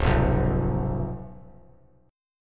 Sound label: Db 7th Db down; Db7 strummed; JGuitar